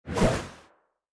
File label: Heavy Swosh